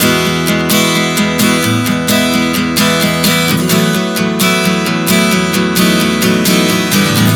E-F#m-B 130 bpm, acoustic guitar chord progressions